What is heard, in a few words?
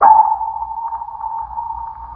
sonar ping